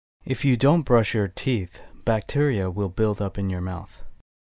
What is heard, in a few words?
build up